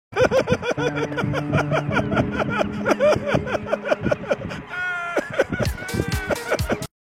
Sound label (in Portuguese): risada; monark